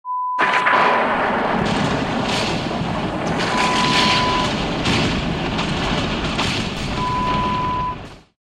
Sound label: Lightning bolt